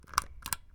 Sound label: Old Lamp Switch